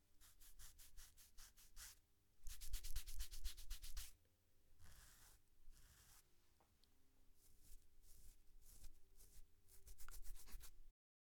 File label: Itching Sound